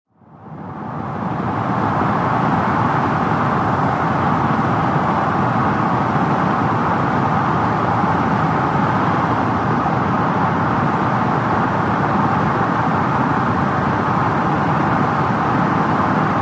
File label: Sedan Accl 00 Mph Slo